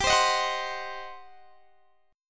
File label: G Major 7th Suspended 4th Sharp 5th, G, down, Guitar, Open D